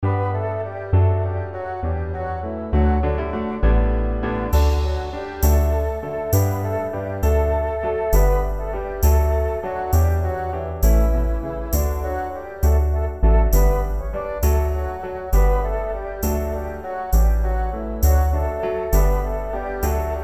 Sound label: instrumental; New Hope Music